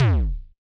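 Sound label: Bass Drum Hard; Yamaha DJX-2B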